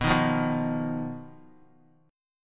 B Major Flat 5th B, chord; BMb5; strummed

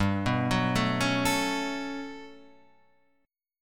G Major Sharp 11th G down Guitar Half Step Down

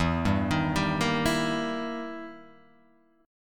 E Minor Major 7th Flat 5th; x,x,2,0,3,2,0,1

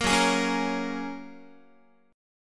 Gb Major Add 9th, down, Guitar; strummed; JGuitar

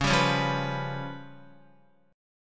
Db 7th Flat 5th, Guitar; Db7b5 strummed; JGuitar

